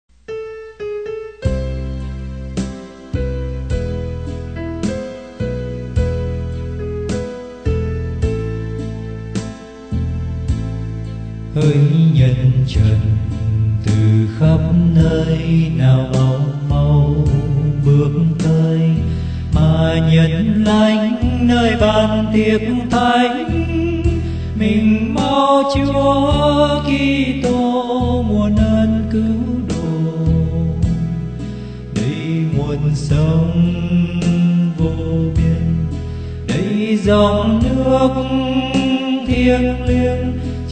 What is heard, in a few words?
BASS